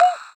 EQ Perc, Percussion Mix

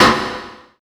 CYBER KIT, DRUMS

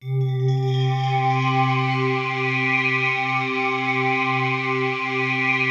PAD, DIGI-PADS

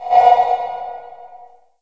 LARGE HALL 808 CB 808

